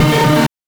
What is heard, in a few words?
BANK2 SFX, NES DPCM; MIDINES